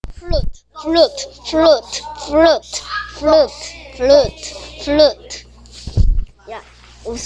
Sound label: flute